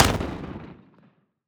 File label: grenade explosion